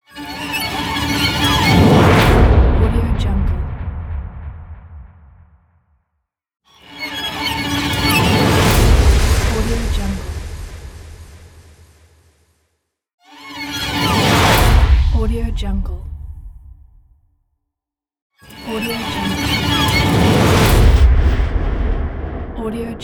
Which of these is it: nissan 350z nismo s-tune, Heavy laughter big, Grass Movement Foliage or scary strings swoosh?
scary strings swoosh